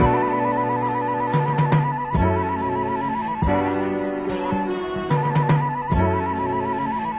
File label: horns twiddling flute, phonky loops